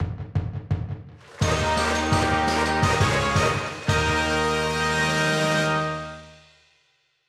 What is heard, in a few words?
Ouen Brassband